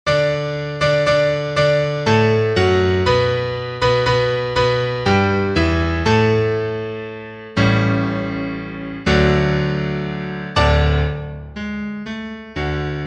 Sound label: PIANO